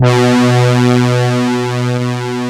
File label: Polimoog; instrumente